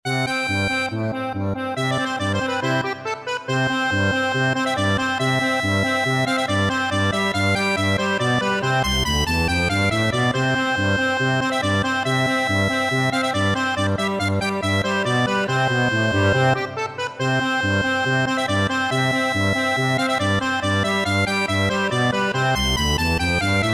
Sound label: bulgar, accordion; der shtiler